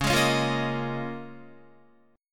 Db 6th Add 9th Db down Guitar; Db6add9; strummed

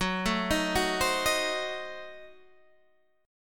F sharp Diminished 7th F sharp Guitar Standard; arpeggiated; JGuitar

